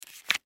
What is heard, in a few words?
Cards Deal Single, Cards Deal Single Card Poker Playing; Casinos